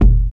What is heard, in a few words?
rap bass drum one shot e key, Royality free bass drum single shot tuned to the E note; kick drum samples